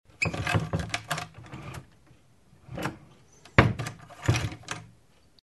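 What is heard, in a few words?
door sounds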